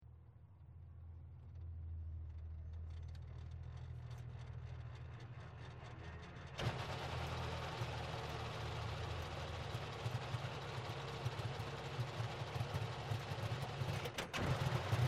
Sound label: BRM incar